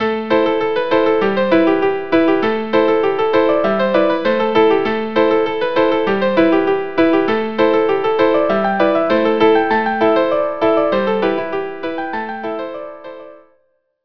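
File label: violin viola; castle kelly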